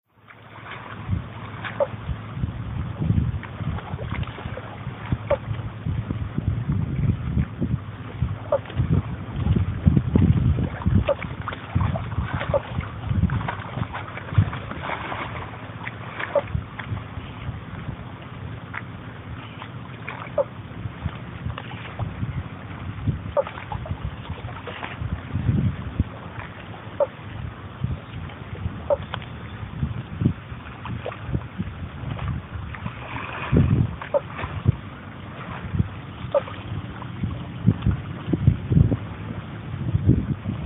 frog water at brown lake stradbroke island

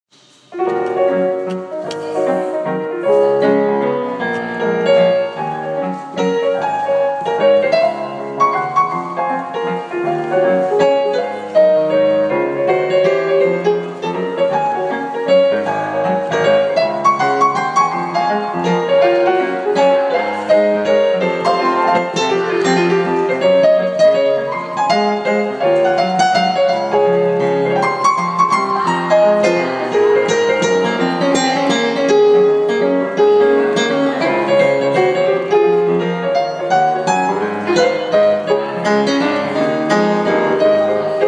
swing

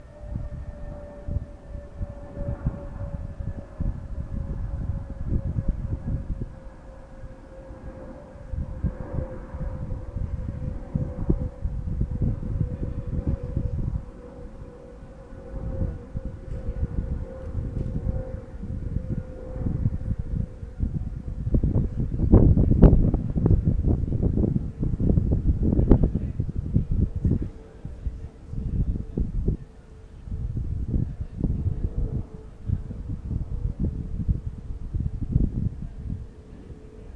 london fields train station ambience

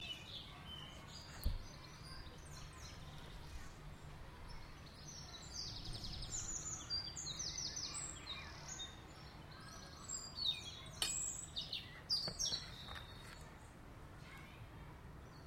birdsong in my garden

